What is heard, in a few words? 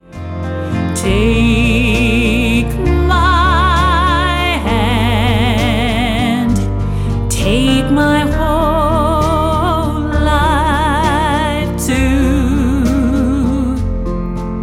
The Vintage Voice